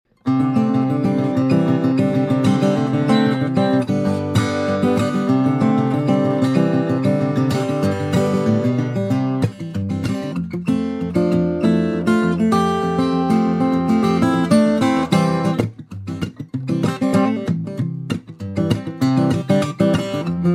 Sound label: Single 0 sample, guitar